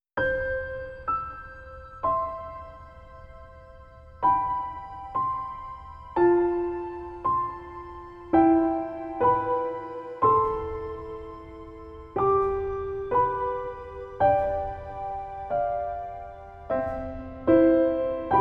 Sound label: Piano, Baby Lullaby Piano Sleep Music